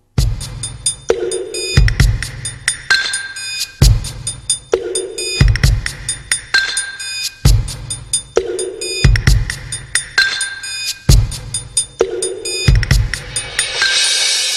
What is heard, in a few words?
Percussions